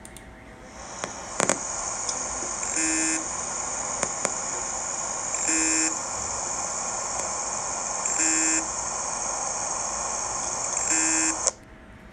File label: noise; Hardiran